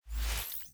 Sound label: digital whoosh